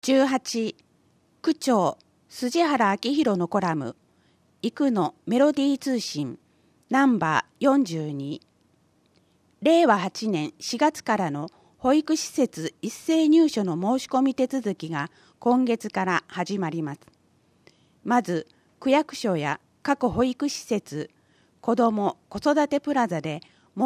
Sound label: melody; いくのメロディー通信 No.42; 広報いくの令和7年9月号（No.352)